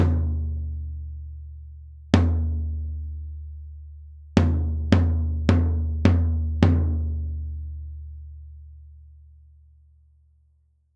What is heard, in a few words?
DP30C on tom; Earthworks